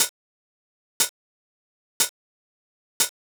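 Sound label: 909 open hi hat; hydrogen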